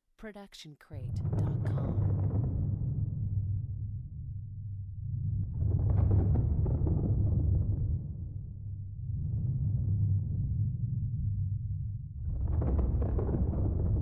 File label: Thunder; SoundsCrate